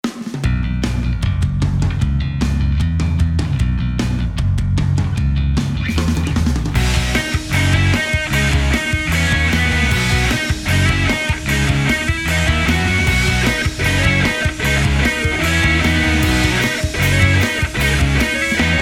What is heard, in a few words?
beat; moh samples